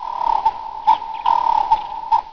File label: Birds, ambience